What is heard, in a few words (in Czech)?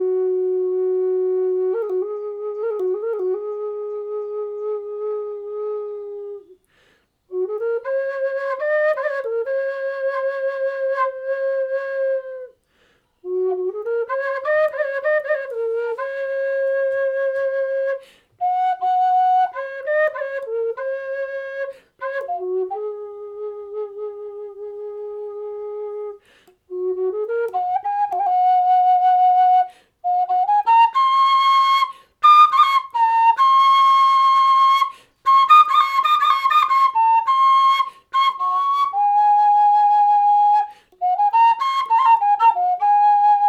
Pentatonická píšťala A dur